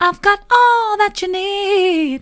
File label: i've got all that you need soft, vocal